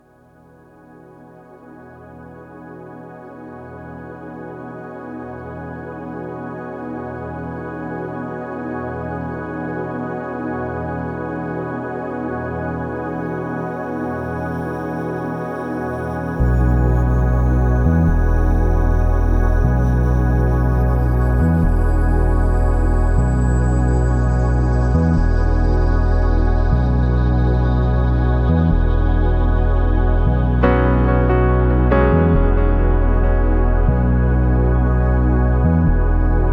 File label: Electric Waves